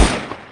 pistol shot

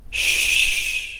shush

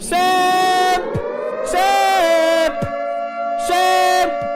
simp alarm; pewdiepie

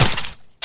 blood splatter

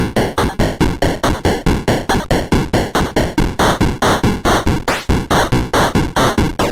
retro beepbox noise beat

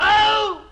scream; wilhelm

